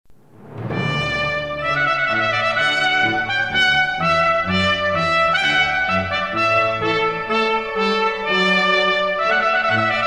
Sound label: TRUMPET VOLUNTARY